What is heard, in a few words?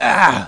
pain; Alien Arena; hunter